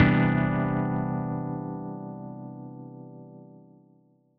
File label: Maj9; PETERRIVIERA